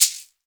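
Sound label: shaker, percussion; ILIO; Synclavier Percussion 2; World & Orchestral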